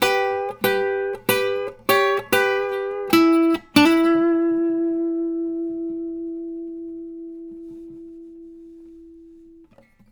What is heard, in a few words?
licks, Blues Guitar; ILIO; Fretworks